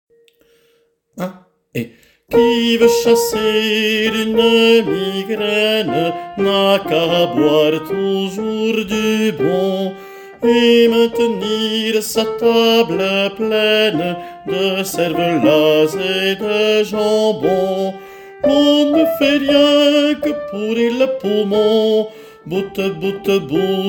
chanson a boire tenori; qui veut chasser une migraine

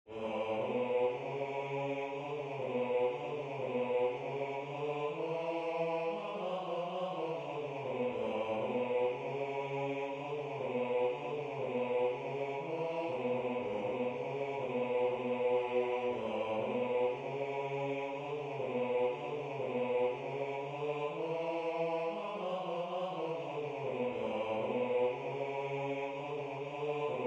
cancan basse, chorale; cancan la vie parisienne

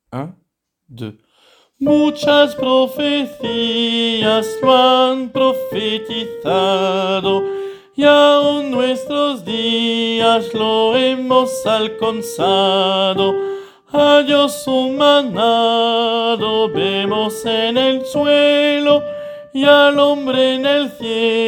couplet alti, chorale; la bas dans la prairie

